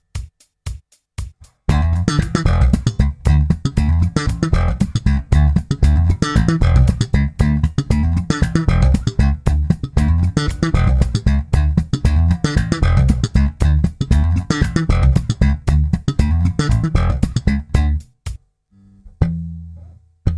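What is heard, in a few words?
Slapline in E, bass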